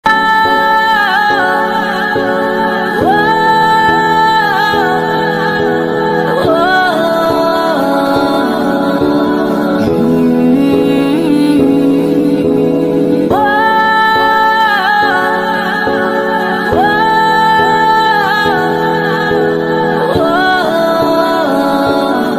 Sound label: only music tone, bengali sad emotional ringtone; bangla sad ringtone